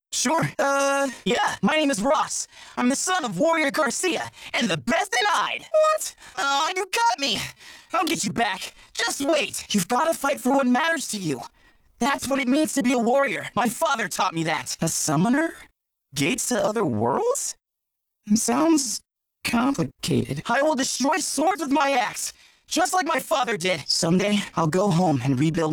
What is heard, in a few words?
autotuned; dim egg rock